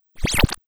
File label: Cartoon warp